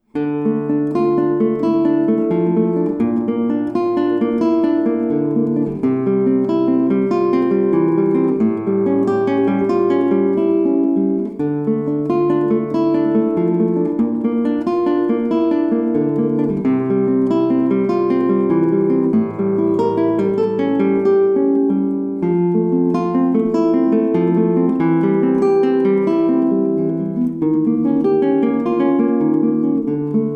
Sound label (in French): Prelude en Ré mineur